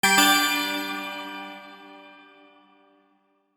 Minigame Jingle

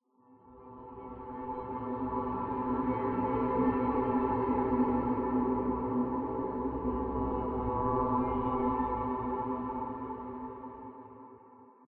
Granular Voice; The Sinking City

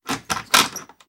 Filing Cabinet Unlock

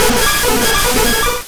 Bubblebeam

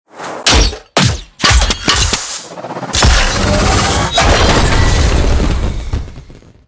Legendary Chest

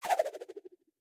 Throw Aimpowered; Boomerang Fu

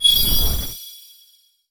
SFX magic flame of light

